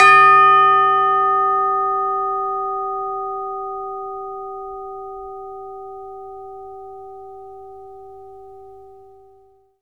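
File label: CHIME F5; ILIO; Synclavier Percussion 2; World & Orchestral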